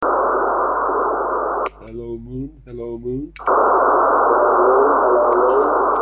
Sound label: SSB ECHO